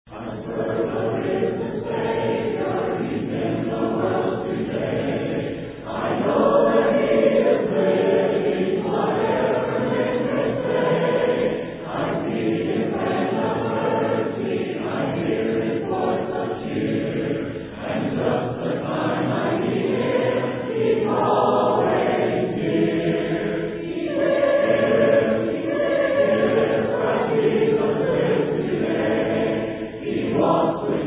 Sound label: a capella hymn